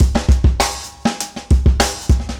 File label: funky 100